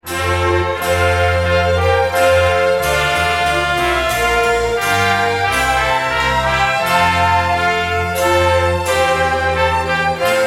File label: minuet